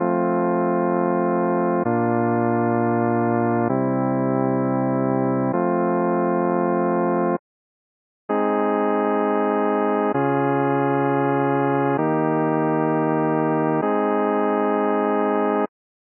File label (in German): c dur as dur werckm iii